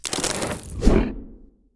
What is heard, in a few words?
boulder throw; hero grawl